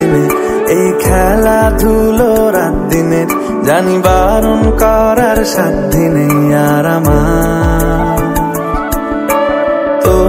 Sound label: bengali gangster ringtone